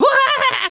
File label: roar; The Elder Scrolls III - Morrowind; scamp